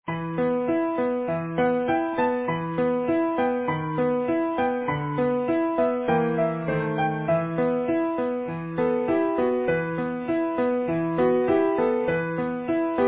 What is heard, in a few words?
Swan Lake, Easy Piano